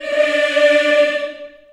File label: D4, Choir